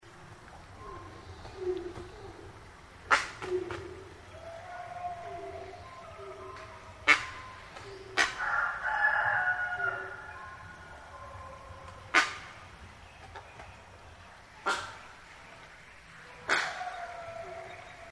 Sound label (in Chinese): 黑冠麻鷺; 黑冠鳽; 鷺科/留鳥